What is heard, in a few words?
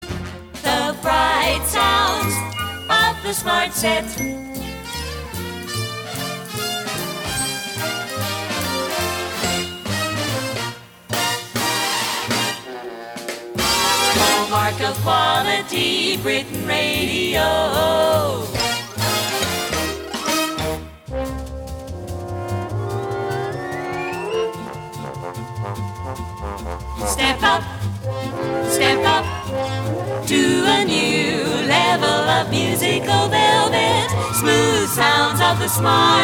jingle montage